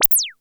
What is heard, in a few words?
PER, TECHNO PERCS; Crypton - DrumBase5000